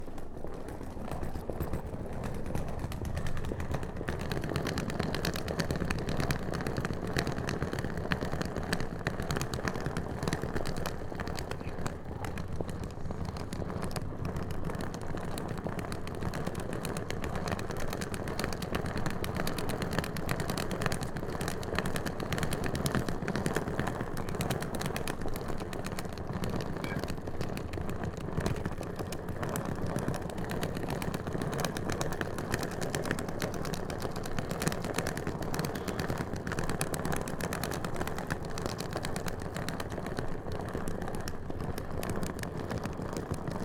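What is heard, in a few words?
Wheeled Suitcase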